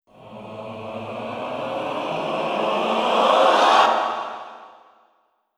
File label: Choir & Rise; трейлерная музыка